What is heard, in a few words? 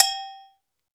Agogo, Drum Machines Roland R-5